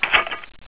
m134 clip off

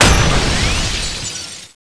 Explosion Ball

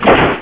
rocketfire, sentry gun

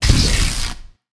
sinister strike; Warcraft 3

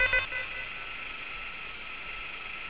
rotate, sentry gun; CSSB

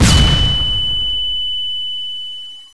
beep explode, ambience

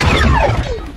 plasma exp

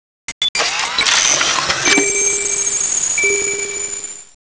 ion cannon beacon set

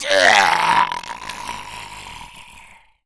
death, zombie

